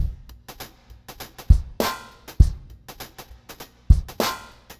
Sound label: Remixed Drums 100BPM; The Roots Drumloops